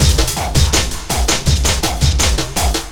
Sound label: LOOP, Eurotech; Sounds Good